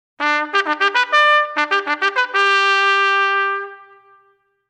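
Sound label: band call, Ceremonial Music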